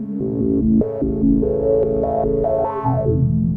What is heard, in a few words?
A pulse